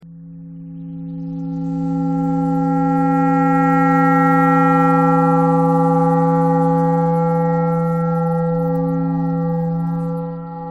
clarinet thread noise

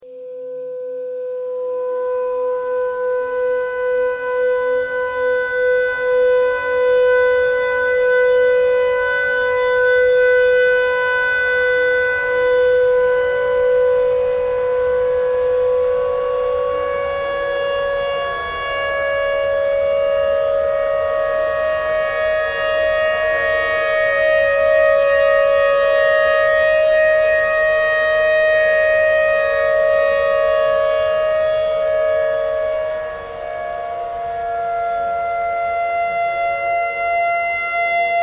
tornado siren clarinet notes e noise

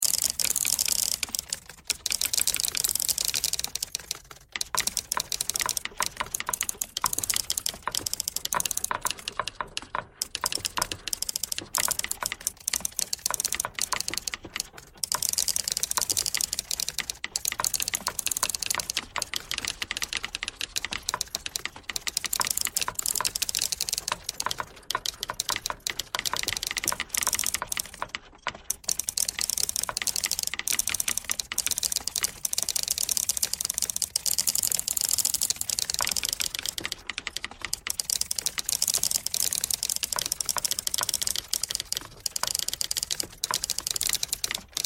typewriter thread all

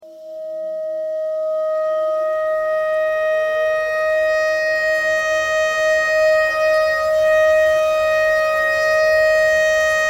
tornado siren clarinet notes e thread simple

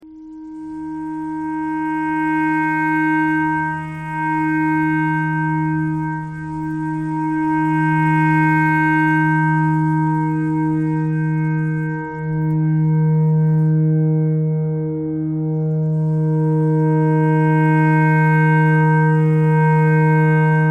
clarinet notes e clarinet thread pentatonic

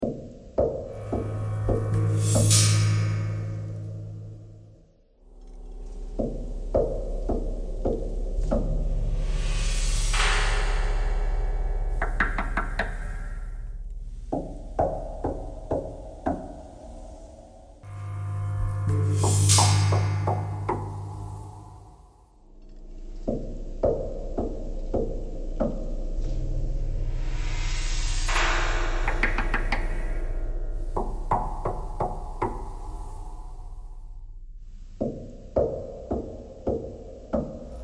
knocking thread noise

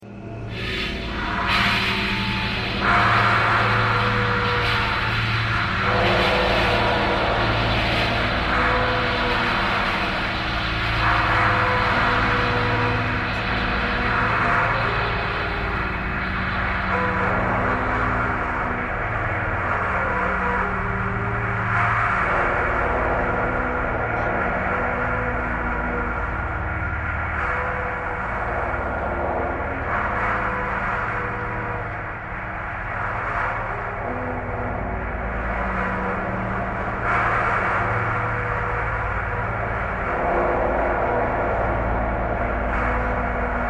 traffic core birds thread noise

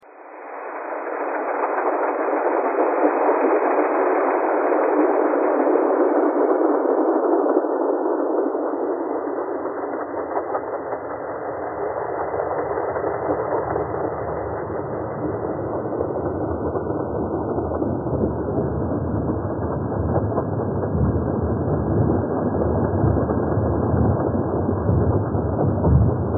train thread all

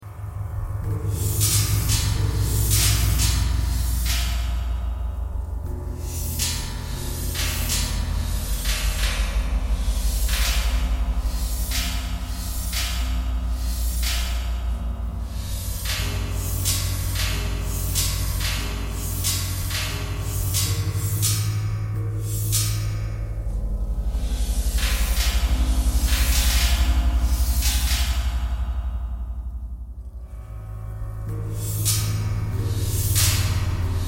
knocking thread noise all

